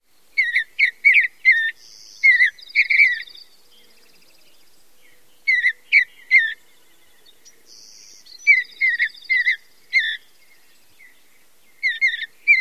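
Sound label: song, Mountain Bluebird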